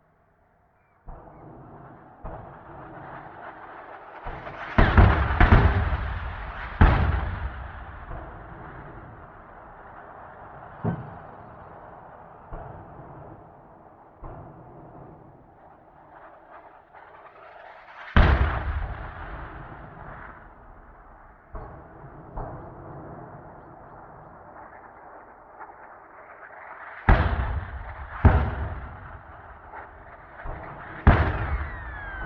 Mortar Cannon; Sound Ideas; Guns

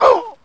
Male Hit By Pitch Baseball

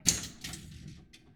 Pinball Flipper Hit Ball

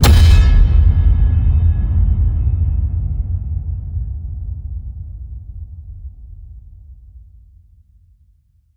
HIT Massive, Cinematic Hits Designed

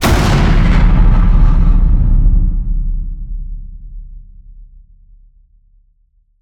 HIT Distorted, Cinematic Hits Designed

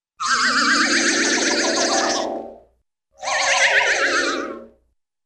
Vibra Harp & Slide Whistle; Cartoon; Hollywood Edge; Cartoon Trax

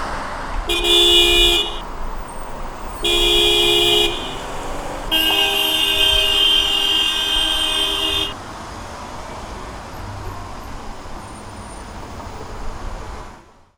horns car mix; best service Blue Box

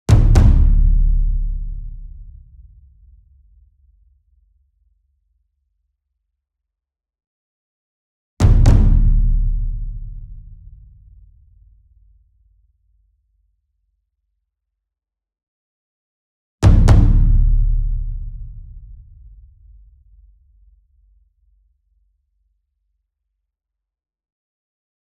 ENSEMBLE DRUM Taiko Double Near; boom library; Cinematic Strikes Construction Kit

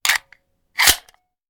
Hand Gun Foley; Sound Ideas; Guns

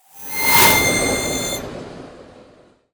Ice Spell Whoosh, Cold Whooshes; Crystal Chill; Arctic Aura

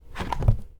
Pickup Wood Picture Frame Grab; Pickups

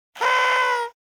noisemaker; NCH Library

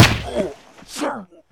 Baseball Bean Player

